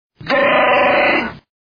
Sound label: GROWL; CHEWBACCA